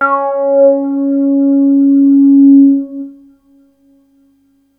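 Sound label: bass C#3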